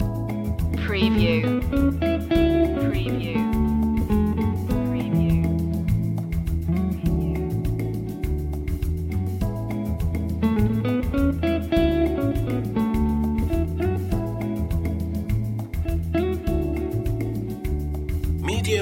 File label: happy rhythm loop